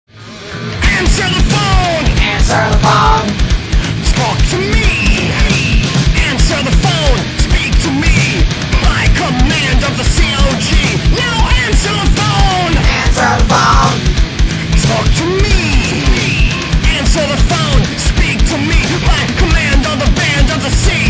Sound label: bow ring